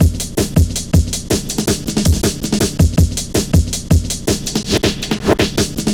Drum Stepz, Drum Loops 161.5

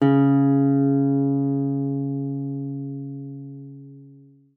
C#3, GUITAR; Xsample VOL-2

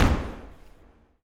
STOMPER; Spectrasonics Vocal Planet

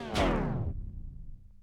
Turntablism; Sonokinetic - The Roots Drumloops

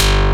SHARP BASS; Pro-Rec - Synthsonic; BASSES

